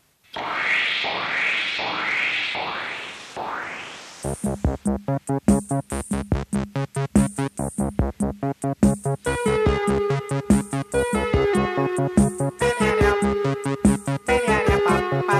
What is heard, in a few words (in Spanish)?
CORTINILLA; DIARIO POP RNE